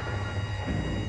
turning; squid game pro jjk; squid game doll

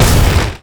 attack; zoochosis whale

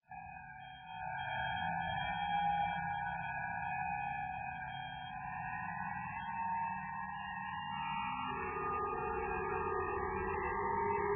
ambience; blizzard spawn

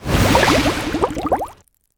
attack critical, whale; zoochosis

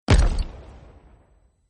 step; zoochosis; lazynap; dolphin deepsea monster

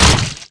painkiller hit; dumpster baby titan; ButcherExe

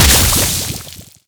antlion shoot; zoochosis; parasite titan

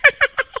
hunter pain short; huggy wuggy snpc; elmo

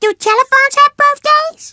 lunge attack; catnap n grabpack; elmo

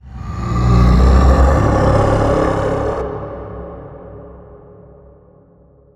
monster; zoochosis; deep sea; lazynap; sea lion monster

